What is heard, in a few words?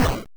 Crush 8-Bit